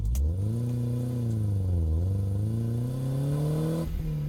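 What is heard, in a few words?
engine high start; Blind Drive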